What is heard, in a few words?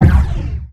laser fire, weapons; Stellaris